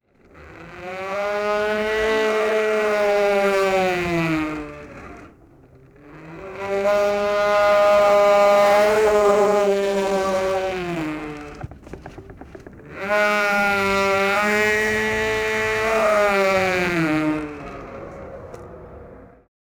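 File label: old man spinning on carousel; amusement park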